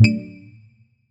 quick stop; netent

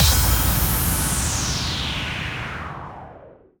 Fs Start; netent

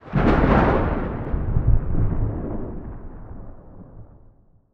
lightning left; netent

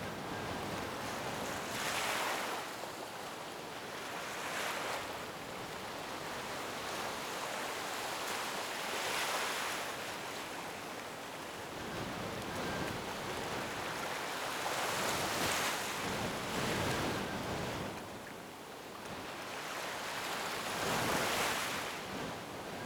Enviroment; netent

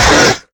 Grunt Hit Light; netent

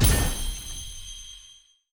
random Wild Appear; netent

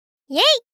Dance Hat; netent

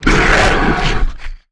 gorilla Win Roar; netent

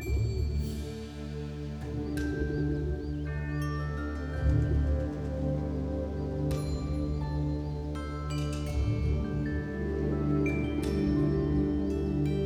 Ambi General; netent

